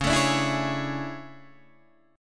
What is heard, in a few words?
A Major 11th, guitar